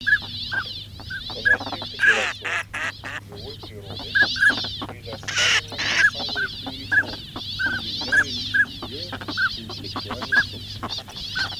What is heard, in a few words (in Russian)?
Шилохвость Codone; plurifon